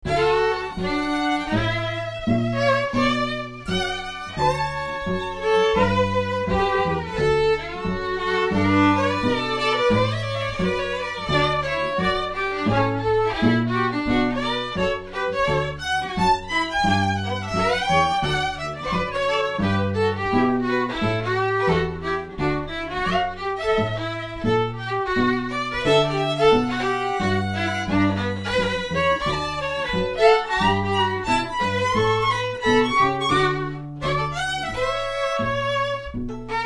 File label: classical hillbillies canon indie